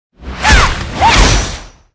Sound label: dark assassin attack, monster